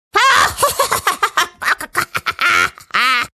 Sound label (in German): Gackern; KLINGELTON